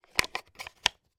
toy toddler wooden puzzle piece place onto board; zapsplat

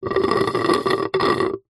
nature water gurgle light shallow

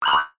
science fiction robot chirp beep metallic short

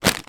food mixed nuts bag pick up; zapsplat

